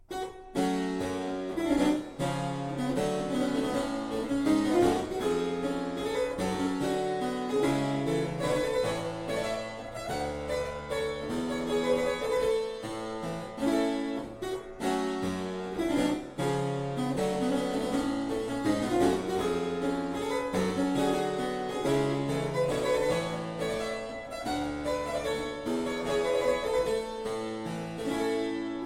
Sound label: Suite Courante; Froberger; Suite 14